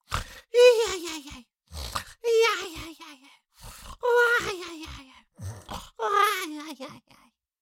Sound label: Cartoon little monster voice snore snoring